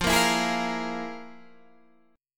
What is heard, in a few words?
F Minor Major 7th Double Flat 5th, Guitar, DADGAD